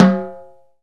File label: LOTMB HND, Timbale; Timbale Battery